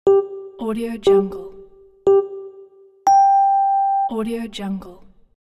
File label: game start countdown; zdravinjo